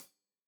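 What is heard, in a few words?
hat closed, hi-hat cymbals stereo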